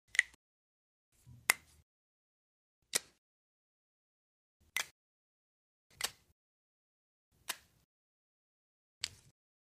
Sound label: Removing the cap from a ballpoint pen